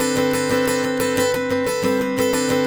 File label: Acoustic Strum, Guitars